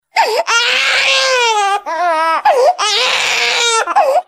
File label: crying